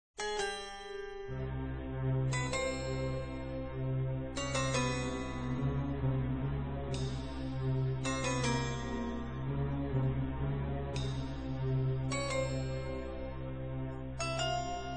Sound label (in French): Musique d'attente